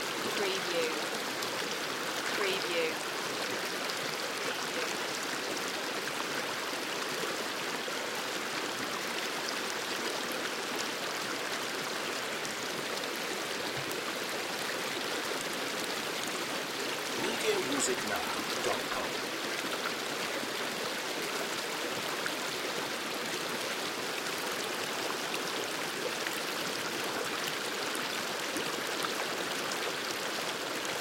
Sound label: River or Stream Close